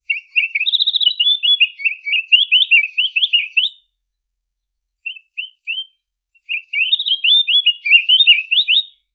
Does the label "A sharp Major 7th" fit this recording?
No